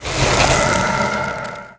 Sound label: spell slow; magician